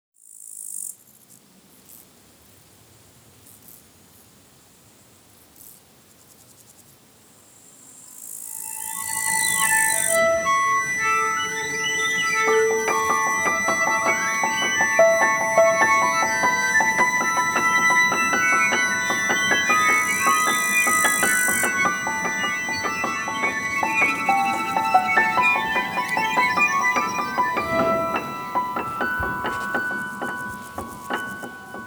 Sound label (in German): Feenmusik